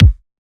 kick sticky, Drum One Shots; SD MIDNIGHT Sample Pack; Slate

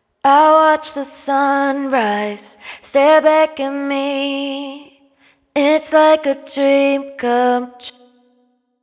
hook Sunrise 90 G#m wet, Vocal; Slate Digital Omnivox Vocal Sample Pack

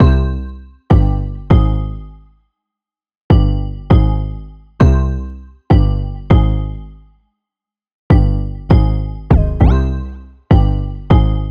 songstarter 100 Cm, Pop; SD PASADENA Pop Sample Pack; Slate

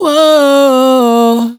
riff G#, Dry Vocals; Raw Vocals; Slate Digital Omnivox Vocal Sample Pack

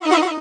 vocal triple, B; SD AURORA Sample Pack; Slate

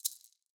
maracas Single Shake; Raw Perc One Shots; Slate; SD SEISMIC Drum Sample Pack

